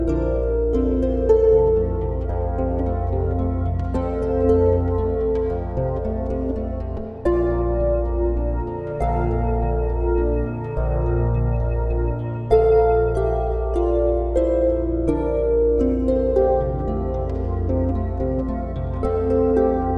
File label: Wedding Music